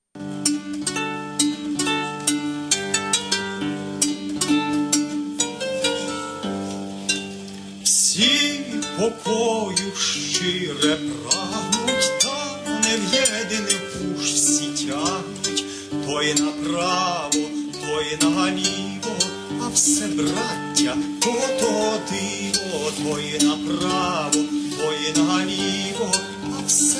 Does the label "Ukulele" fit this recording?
No